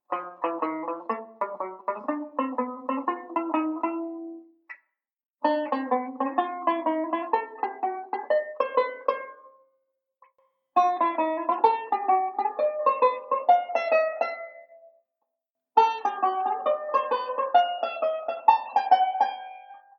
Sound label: Enclosure One String, banjo; Beyond Chord Melody Volume II